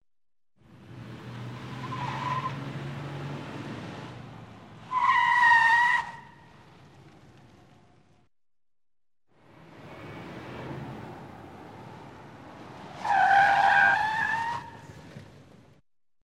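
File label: skid braking sideways slide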